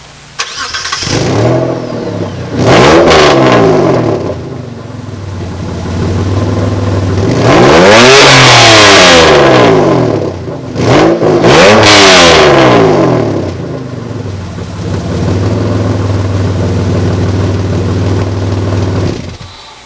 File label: stealth exhaust vr4 test pipe; garage; mods